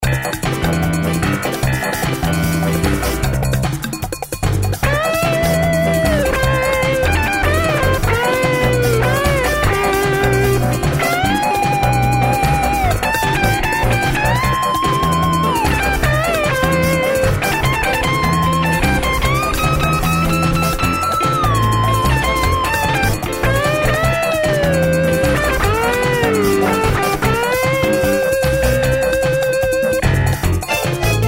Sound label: rockin tango